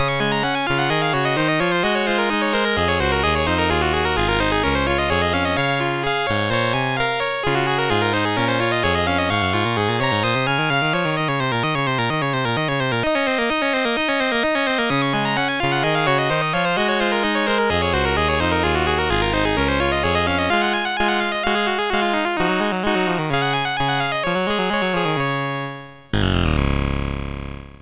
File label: Keyboard Piece C Sharp Major, MOS6581R2